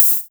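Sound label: Tr909 hat open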